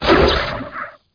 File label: probe get hit; MOTS